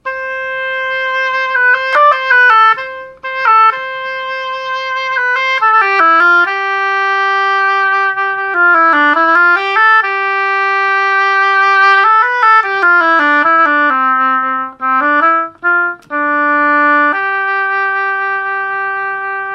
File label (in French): son hautbois; escape game